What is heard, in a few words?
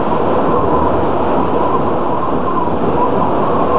windy; quake; fortress; ambience